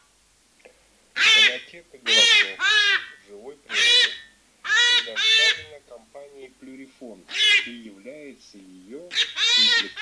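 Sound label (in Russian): Заяц раненый Lepre Grido, манок; plurifon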